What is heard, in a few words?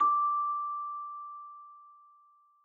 Celesta Higher D